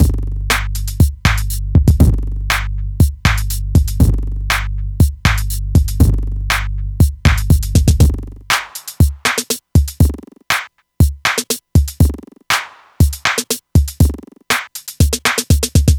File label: Beat, club; Sonic Foundry (Sony Creative Software); Club Joints and Street Anthems